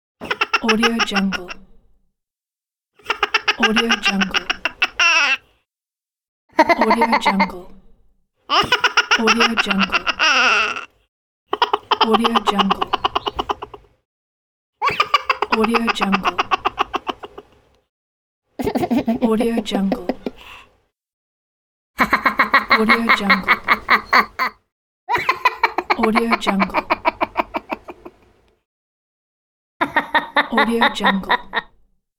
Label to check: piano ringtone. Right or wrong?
Wrong